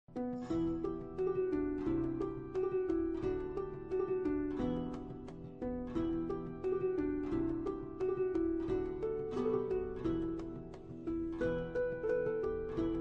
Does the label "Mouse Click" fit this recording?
No